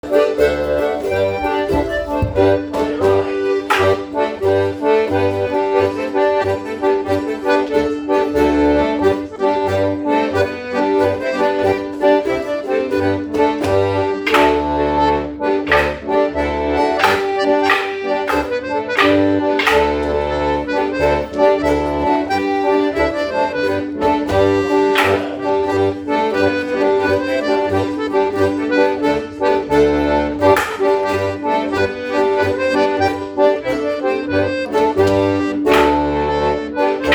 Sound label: Follow the drum; Chingford